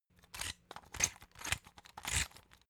salt mill squeak sequence

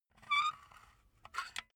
silicone gun empty pump slow; berufe; handwerker; soundpool

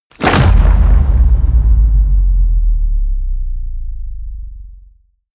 hit wood, hits impacts

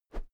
whoosh thick

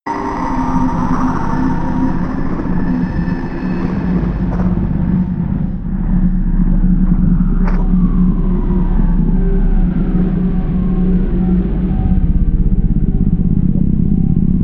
drone mr beam

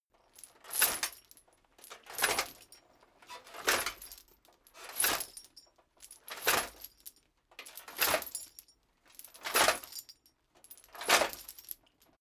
metal chain movement on plate sequence

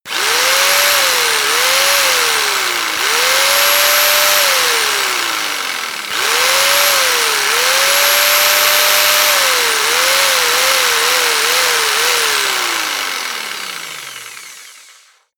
jigsaw engine sequence; maschinen; saege

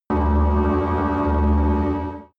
extrinsic buzz, energie

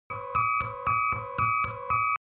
sci fi alarm beep room; soundpool

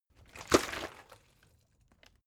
bottle water sparse upend hard; gastronomie; berufe

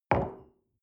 coffee place table soft

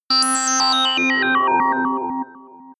sci-fi dark planet, digital

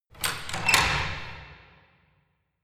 church door

